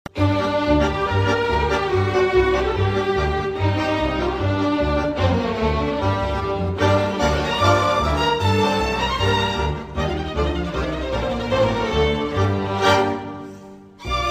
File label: Hungarian Dance